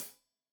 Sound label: Hat Tight, Hi-Hat, Cymbals; Deeply Sampled Drums